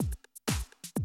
Classic DrumLoops, 125

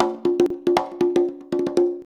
CONGA 119, Modern Percussion Loops

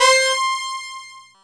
OB, OB modular; Greytsounds VOL-1

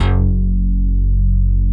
PPG BASS; BASS SOUNDS

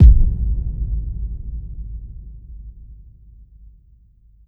SFX, Trance NRG

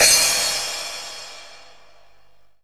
CYM, Dance Drums

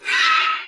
HOA HORSE, Anime Sound FX; COMIC

